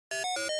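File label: Device Activate Button, computers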